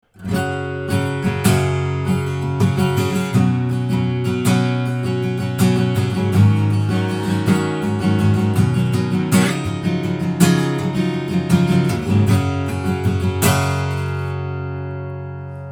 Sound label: instr; apogee hypemic